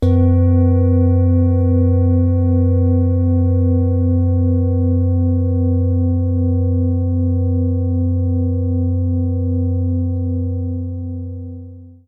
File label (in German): Klangschale; Jupiter; Sonne; Liefeld